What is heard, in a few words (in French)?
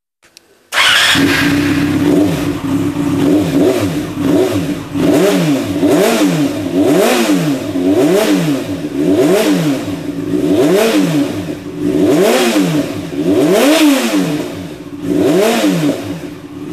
FZ6 akra sans chicanes, echappements